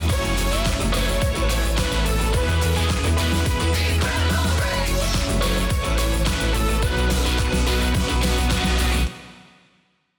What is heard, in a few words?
theme music; incredible-race